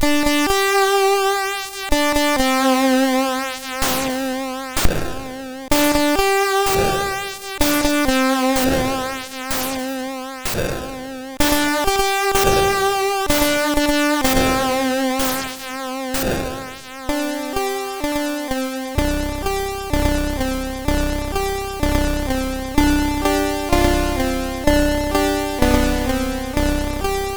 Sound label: Demo Sound, MOS6581R3